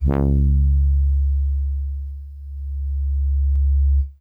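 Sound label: Soft Horns DX100 C#2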